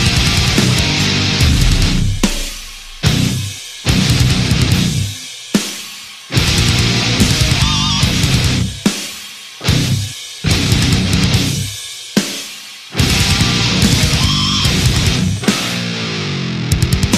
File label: slow dance